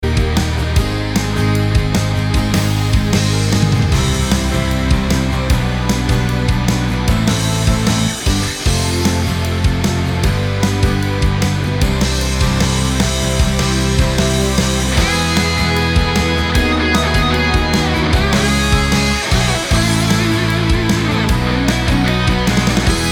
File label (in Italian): versione rock